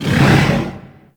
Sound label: Loot Room Elevator Start; Ambience; Gameplay; Warframe; Orokin Moon